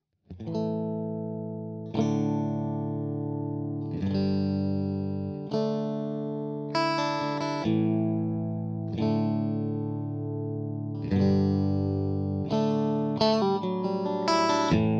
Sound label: Colonial Strat